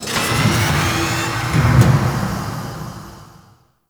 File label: Machine Lift; Ambience; Warframe; Corpus Railjack